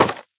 cardboard pizza box impact; physics

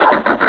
laugh, combine suppressor

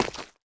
mud, footsteps

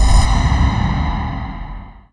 machine hit, machines; Half Life Model Pack; Half Life 2

